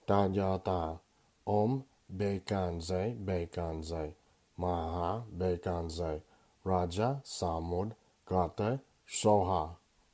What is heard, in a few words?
Medince Buddha Tibetan Mantra